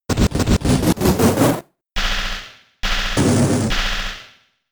Shock Wave

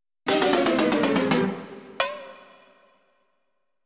Defeat Jingle; tokimeki memorial 2

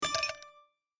Chip Destroying; my cute commissar 2020 gamerip

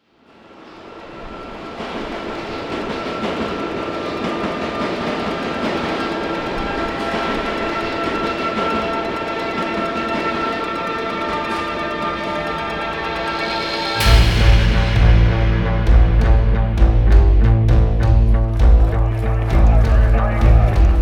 Metro Music Loading; Battlefield 4

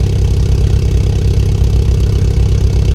Cadillac Escalade Idle

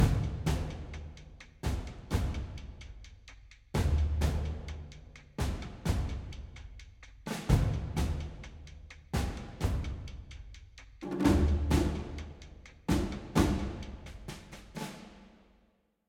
Music Battle

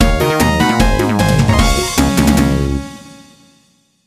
Stage Clear Normal Game; bomberman party edition

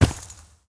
warrior walk carpet

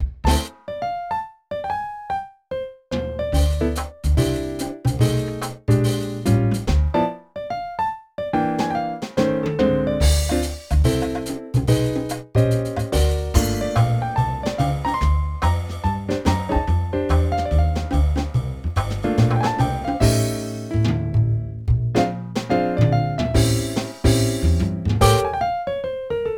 pub; Lunar Knights; Nintendo DS

